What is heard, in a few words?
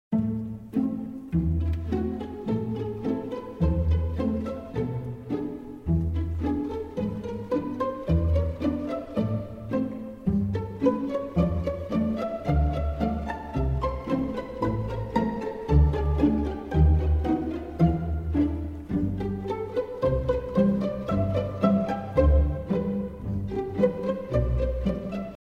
Talisman Female Variation